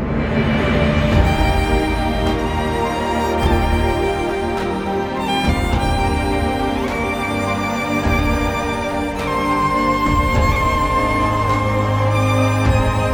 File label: epic cinematic